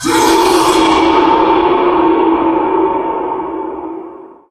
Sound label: male scream; Source Engine; tf